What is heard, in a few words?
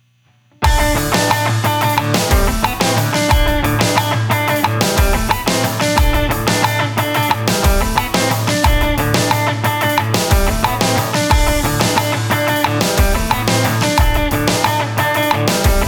funky